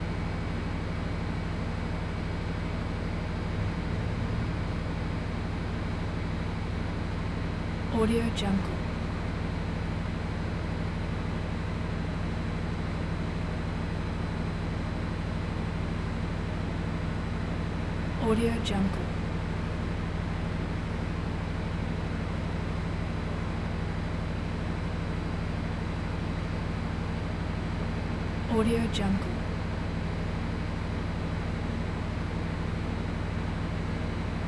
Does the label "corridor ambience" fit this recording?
Yes